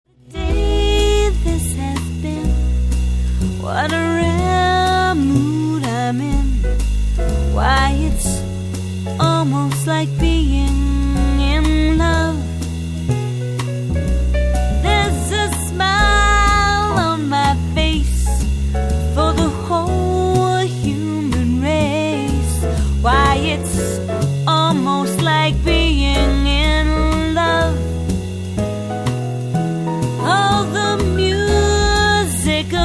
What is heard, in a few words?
Jazz